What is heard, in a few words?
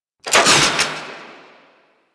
Flak Cannon Movement; Grineer